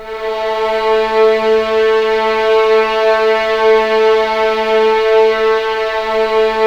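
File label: strings, violins; East West; The Ultimate String Collection; A3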